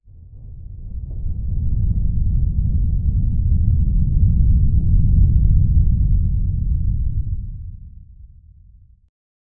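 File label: ambience roaring lava flow, volcano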